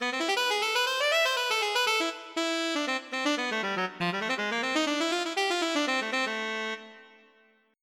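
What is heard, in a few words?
alto sax; Roland - An Invitation To The Roland World Of Music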